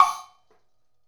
Wood Block; Essential Percussion

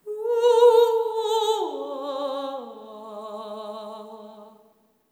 LAMENT

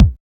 HARD HS KIK; Drums In Your FACE!

